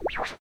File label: Rotor Right; Salem's Sound Schemes